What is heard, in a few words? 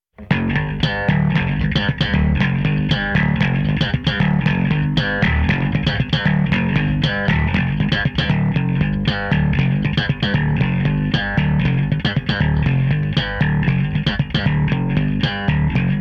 rhythm